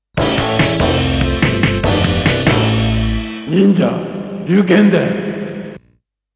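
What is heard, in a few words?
Round Clear (arcade)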